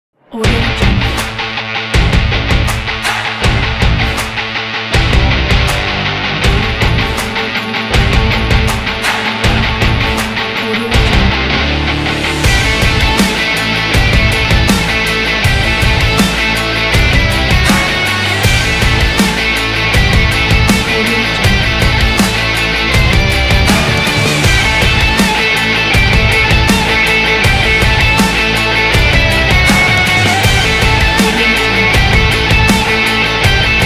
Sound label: sport rock drive intro